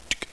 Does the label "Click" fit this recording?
Yes